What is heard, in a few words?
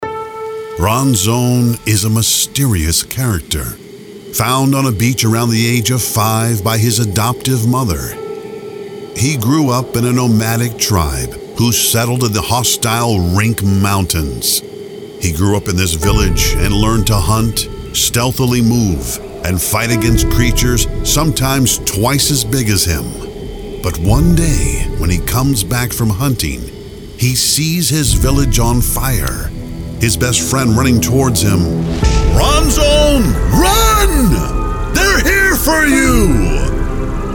sfx vocal; NomadLand